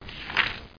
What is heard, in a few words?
Bottle